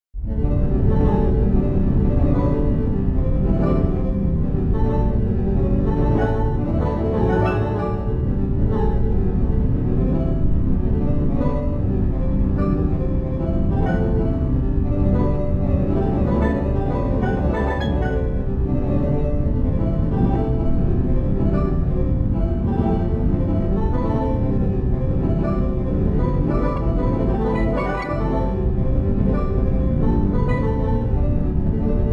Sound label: fast ripples; fractal music